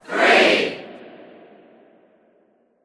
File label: Tennis Crowd